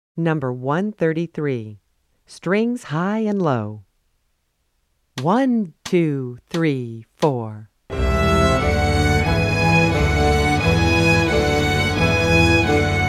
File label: Strings High And Low; New Directions for Strings Book 1